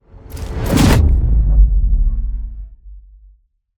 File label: End Battle Boom; bramble the mountain king; ps4 ps5 switch windows xbox one xbox series xs; Skogsra